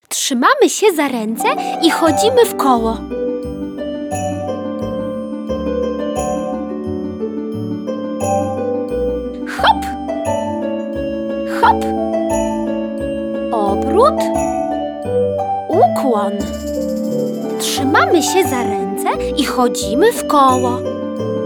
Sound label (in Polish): Menuet zabawa taneczna